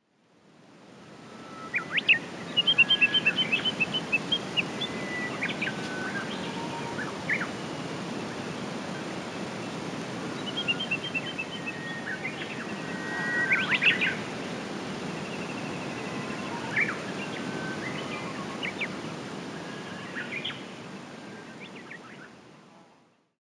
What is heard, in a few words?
Shotgun rec